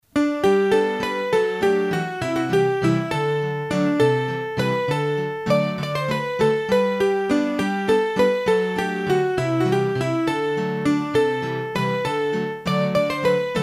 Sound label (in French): Bourree Mussee, hurdy gurdy; Massif Central; Bourrees a 3 Temp